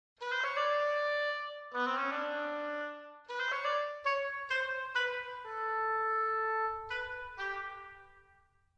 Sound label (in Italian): oboe